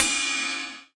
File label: CYM, Dance Drums; Best Service ProSamples vol.15; AKAI